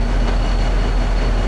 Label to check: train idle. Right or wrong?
Right